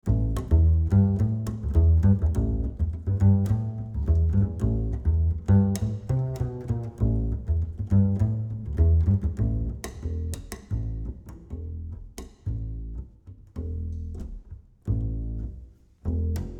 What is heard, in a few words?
Ac Bass 50cm C Plucked Mid